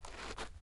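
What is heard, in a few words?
shuffle, footsteps hard surface jump up; grunt footsteps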